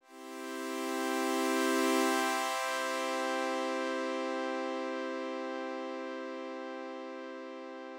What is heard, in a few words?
Pad